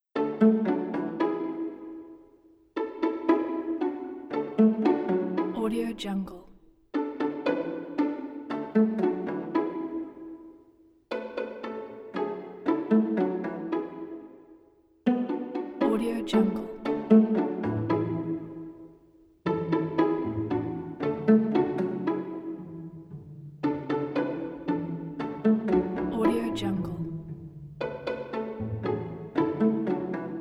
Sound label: curious children